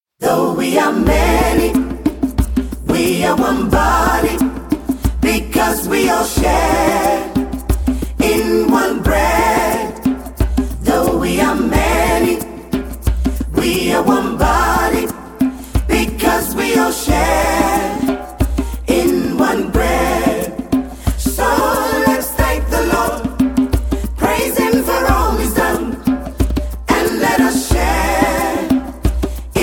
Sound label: Vocal and percussion version